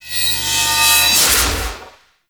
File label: Sword Heavy Attack Charge; Warframe; Duviri; Dax; Weapons